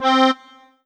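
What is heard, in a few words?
Yamaha TG500 Accordion C4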